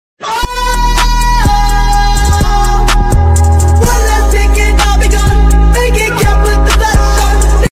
Moaning sounds; from memes

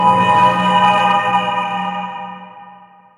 minecraft cave ambience

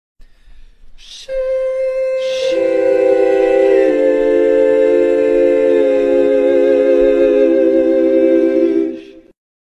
Sheesh Choir

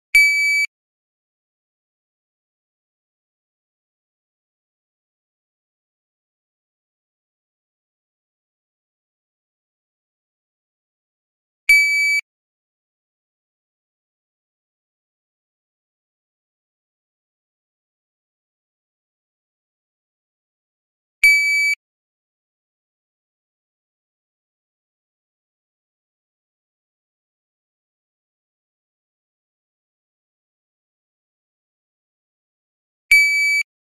DryFire Beep

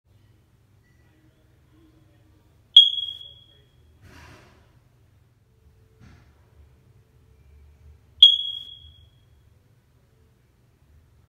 Smoke Detector Chirp

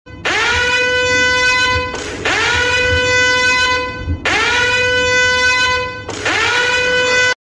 Siren; Meme

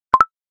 Notification; Snapchat; Tik Tok

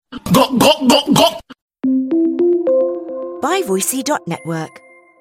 gulp gulp

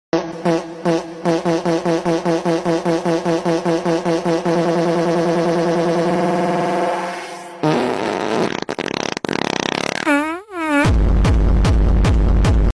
Fart DJ Remix

